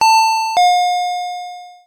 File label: Chime